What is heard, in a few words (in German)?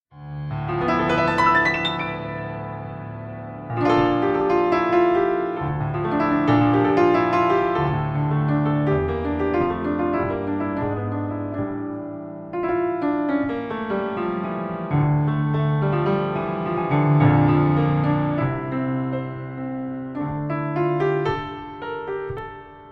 Piano Meditation